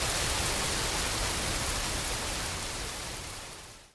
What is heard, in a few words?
rain heavy fadeout